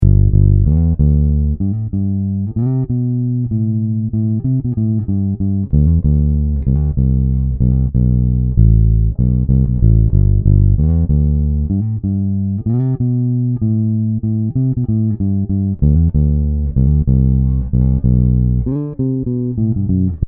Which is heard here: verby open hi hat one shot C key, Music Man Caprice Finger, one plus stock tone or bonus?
Music Man Caprice Finger